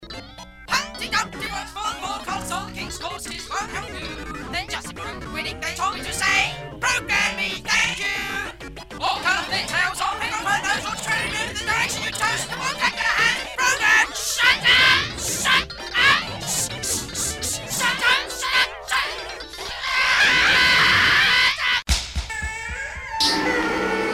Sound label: Nursery Rap